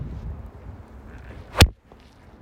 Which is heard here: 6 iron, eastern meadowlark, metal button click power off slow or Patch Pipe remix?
6 iron